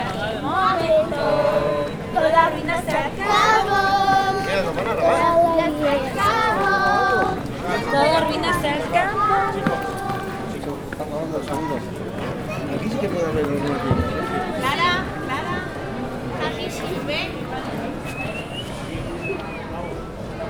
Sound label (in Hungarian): jókedvű emberek